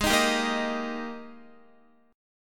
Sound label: Ab Major 7th Suspended 2nd Ab Guitar 4E,4A,5D,5G,5C,6F; AbM7sus2; x,11,13,12,10,10; strummed; chordshape; JGuitar